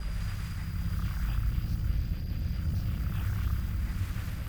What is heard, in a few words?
Orbital Hammer Fly; TANK; 3Souls